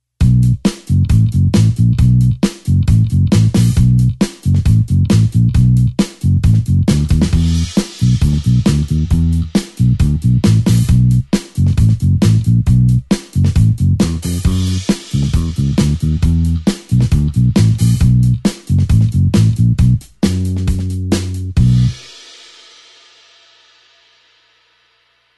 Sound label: blues bassline 8beat B